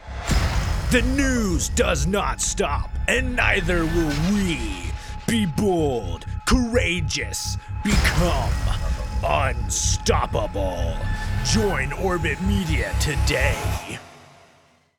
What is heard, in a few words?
Unstoppable Sweep